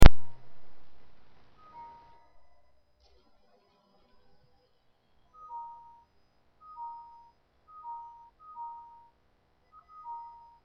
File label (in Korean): 컴터족같은소리